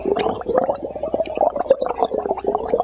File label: kingpin idle; svencoop